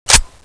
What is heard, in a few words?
clip in, weapons, gepard